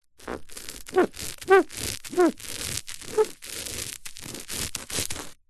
office sticky tape stuck to carpet finger rub squeak